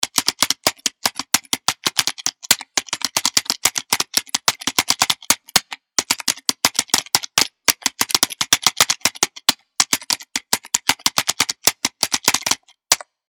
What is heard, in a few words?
keyboard